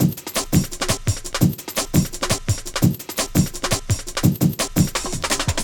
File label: Drum & Bass Beats & Breaks